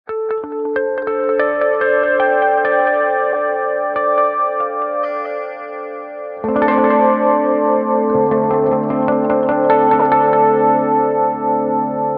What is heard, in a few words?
G steel